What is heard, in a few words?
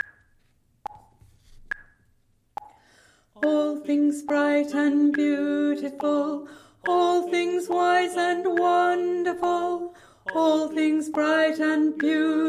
ALTO